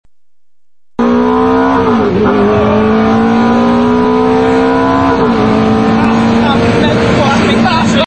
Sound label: Ferrari F430 acceleration